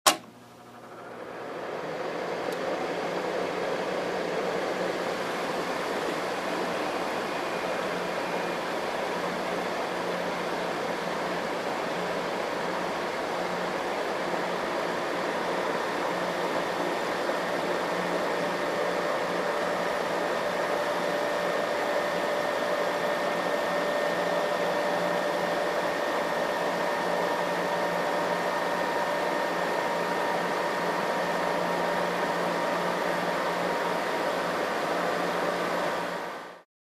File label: Air Conditioner: Window.